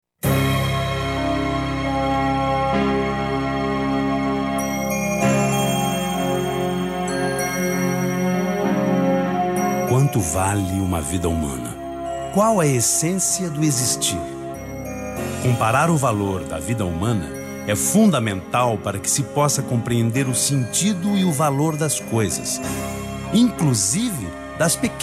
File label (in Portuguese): Trilhas; RBS Rádio Gaúcha